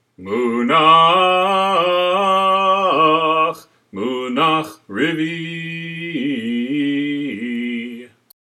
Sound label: Haftarah Revii, trope; sinai